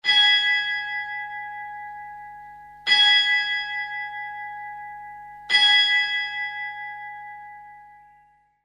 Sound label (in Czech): zvon; florian; zvonohra tony